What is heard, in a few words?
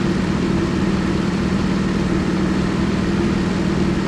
f1 idle, Vehicles